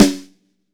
LUDWIG SNARE

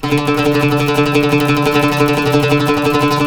TREMOLO, Turkish Oud

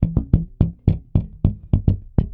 Mute Rhythm, Bass; Bass X

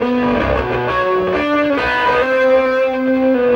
Scary Nite, Electric Guitar; Sonic Foundry (Sony Creative Software); Friendly Fire; Serpent

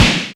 Snare, Alesis DM5

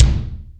KIK ROM

